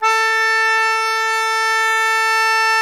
Accordians, Euro. Accord; Club-50 - Foundations Roland

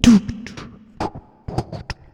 Korg, FX; WS VOC16; Back In Time Records; Korg Universe VOL-1; FX FAMILY

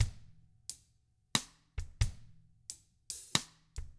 Double Platinum Drums, County

